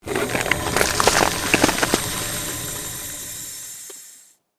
CREATED MAGIC SPELL FREEZING TOUCH; A series of magic or arcane spell sounds designed to suit fantasy themes, Arcane,Created,Fantasy Magic,Ice Magic

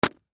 Note Pad Throw Down; Paper; Office; Blastwave

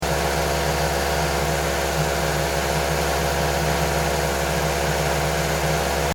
Car Mazda 3 Low Rpm Loop, Engine Loop; Power Car; LYN110 package of Power Car sounds; Car Sound Effects

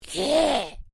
Created Creature Small Call, Alien Sound Effects

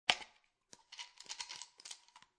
Pill Bottle Open Grab Pill

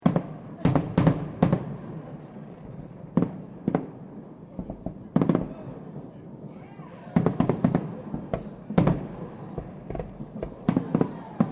Fireworks Small Crowd; Chinese New Year

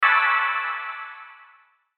Hit Burst Sparkle Shine; Production Element Imaging Element Accent Transition

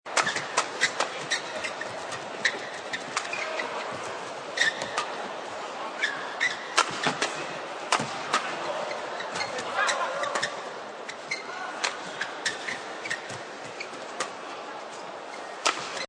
Pro Badminton Match, Badminton match; Miscellaneous Sport Sound Effects

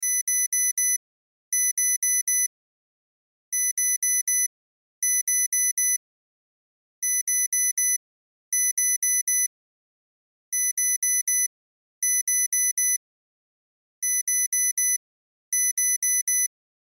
Cell Alarm Chime Loop; Alarms (Sound Effects:Electronics); Blastwave; Technology

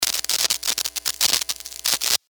sparks, Electric Spark; Sustained Hum And Spark , From Guitar Cable

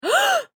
Human Female Gasp; Gasping; Voice

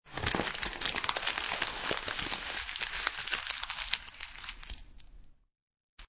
Paper Crumble, Paper being crunched and crumbled; Classroom; Schools

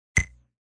Ping; Pings; multimedia

